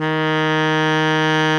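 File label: baritone mezzo forte D#2, Sax; BARITONE MF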